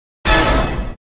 takedown sound effect; burnout 3

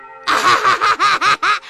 japanese laugh

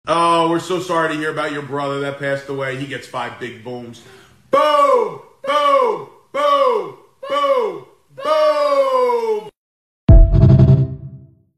five big booms

